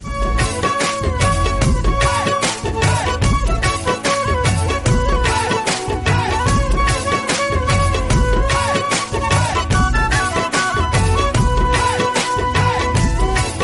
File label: telugu ringtone